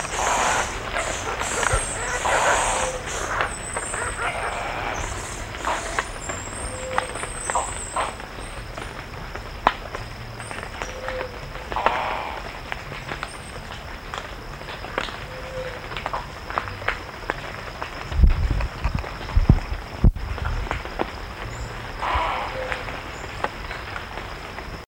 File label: bird